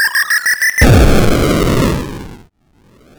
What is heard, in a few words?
Retro Weapon Bomb